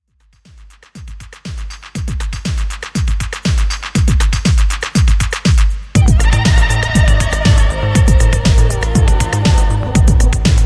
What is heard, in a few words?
Beat Mix